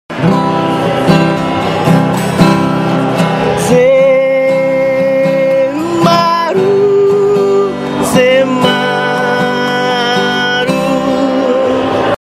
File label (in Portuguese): vinheta cantada